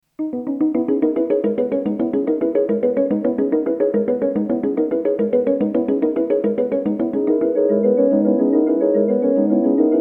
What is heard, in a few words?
Korg Wavestate MkII Hustle Bustle Arp Factory Sound